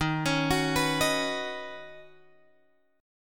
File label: Eb Minor 7th Sharp 5th, down, Guitar, Drop D